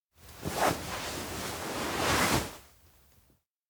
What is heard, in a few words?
stand slow; rikka; anemoi